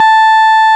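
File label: RMI piano A 4, EP; Sonic Reality - Vintage Timetraveler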